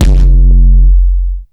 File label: DUTCH KICK; Masterbits; Soniq Elements